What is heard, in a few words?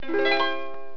level select; ninja cat